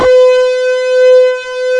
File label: TURBO F5; East West - Global Sounds; DIST.SOLI